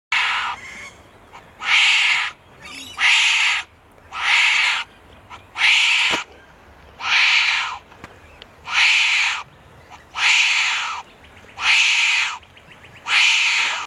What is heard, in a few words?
Wombats; وامبت